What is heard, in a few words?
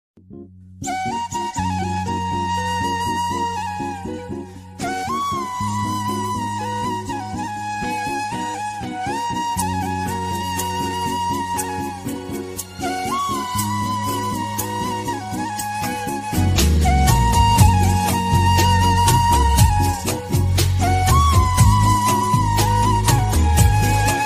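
indian flute ringtone; love flute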